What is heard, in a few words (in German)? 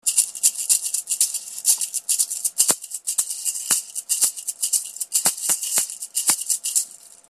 Chekere Klein